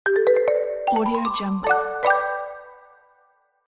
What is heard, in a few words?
win game level marimba